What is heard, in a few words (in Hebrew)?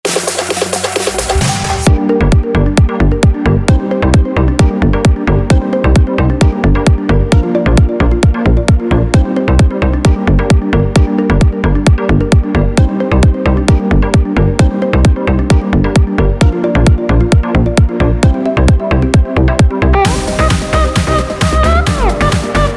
פרוגרסיב האוס פלייבק